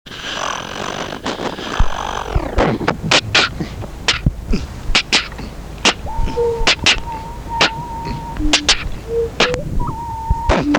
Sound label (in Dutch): het regent buiten; Kind